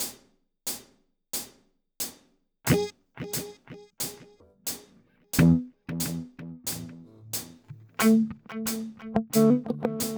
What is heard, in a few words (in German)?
Coole Rhythmen mit Delay 90 BPM Filter Polyrhythms; Guitar Rig 7